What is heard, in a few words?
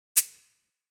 Cabasa single; musical; gfxsounds